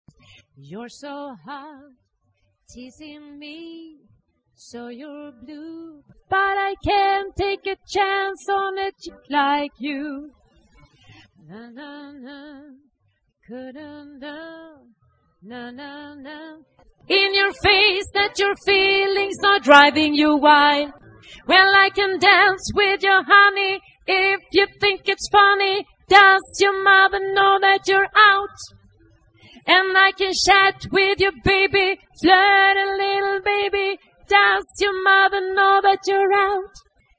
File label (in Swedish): alt